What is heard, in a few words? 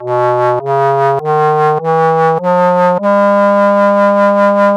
Clarinet